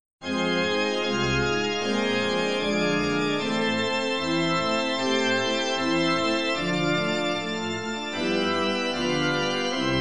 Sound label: church organ